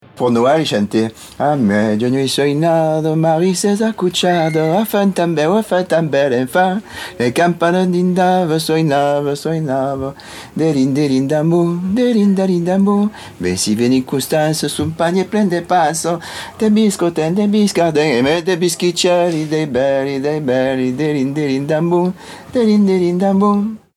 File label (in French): chant traditionnel; nouvelles sonores